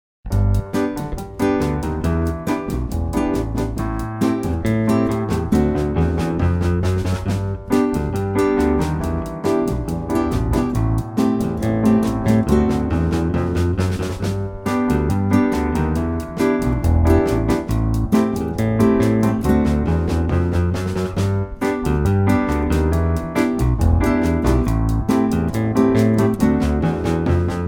basson

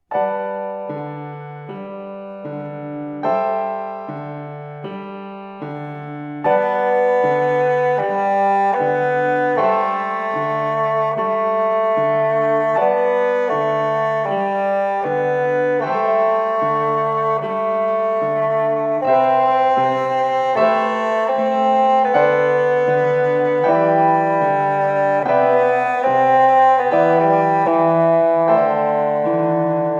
promenade basson

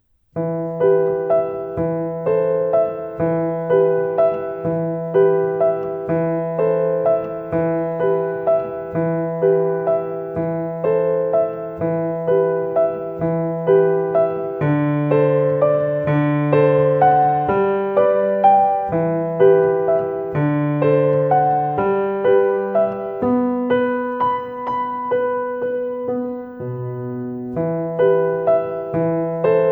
valse acct, basson

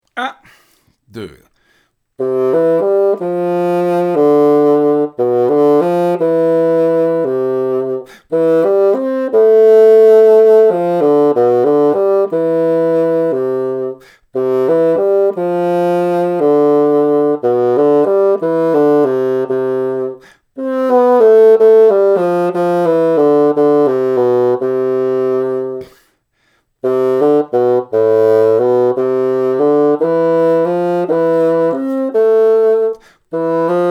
etude, basson

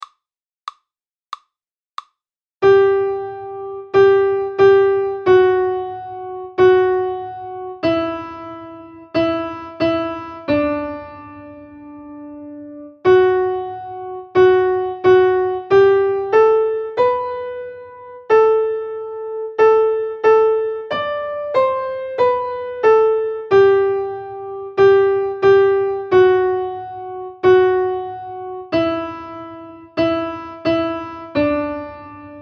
hymn voix 3, saxophone; hymn trio

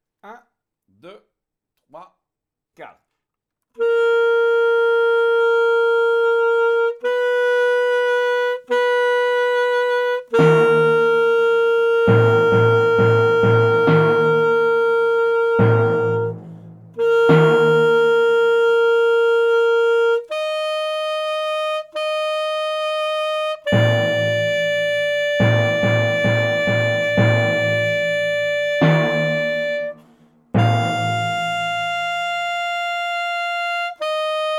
marche, saxophone

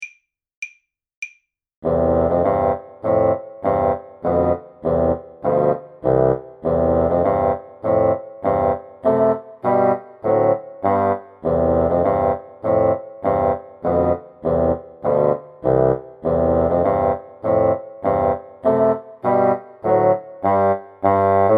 marche soldats trio, basson

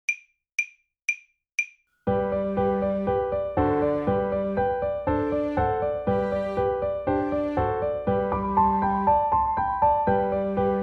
basson

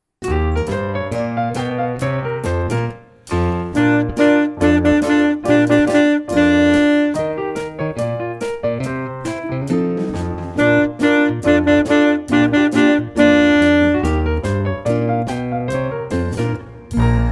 saxophone; mib

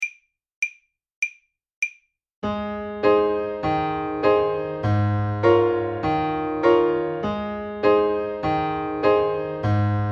danse clown, saxophone

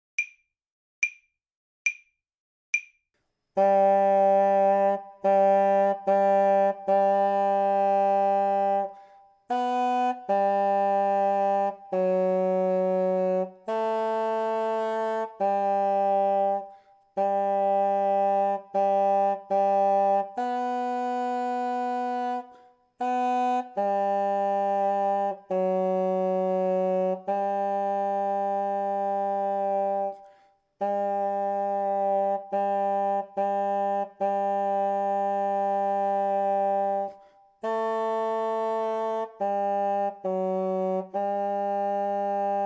poeme 3 bassons, basson; poeme trio

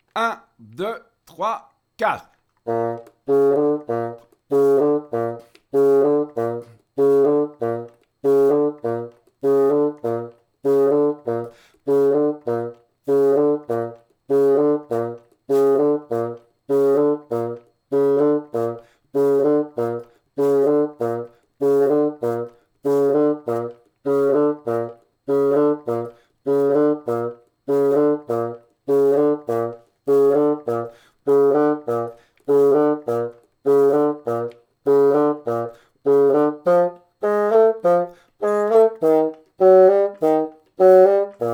baby elephant basson

